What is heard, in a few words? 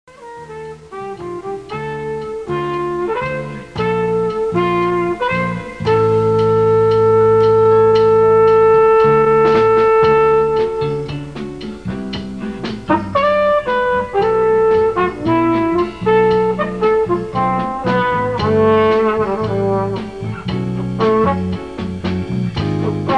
flugel; flugelhorn; rehearsal band cut, 1994; Jazz/Jazz Fusion